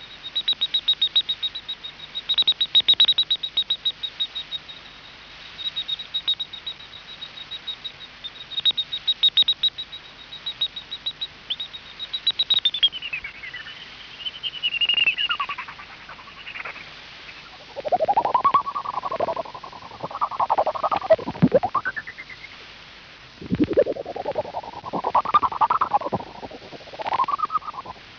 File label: rhinolophus ferrumequinum, chauves souris; les chauves-souris; les grands coudrays; la biodiversite